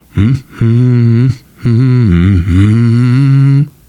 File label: nervous humming, survivor voice, biker